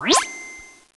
point

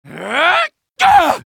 medic taunt head exert

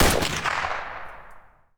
rank down medal shoot

